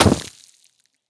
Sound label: snow impact bullet; physics